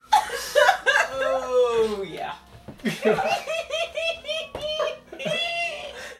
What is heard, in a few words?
laugh